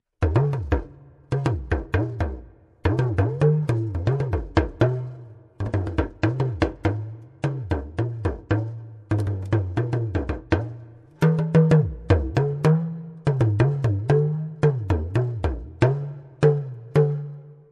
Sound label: talking drum